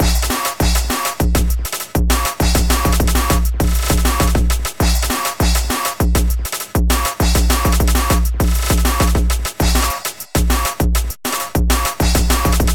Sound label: drums